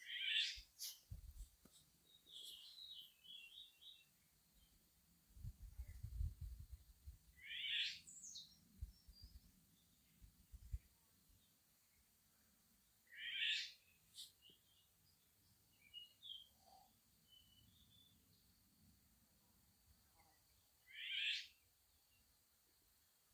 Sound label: Eurasian Golden Oriole